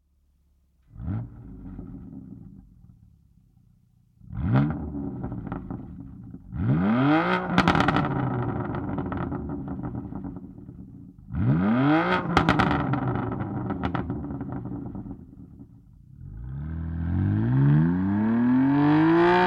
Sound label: Link Pipe set BMW M4 OPF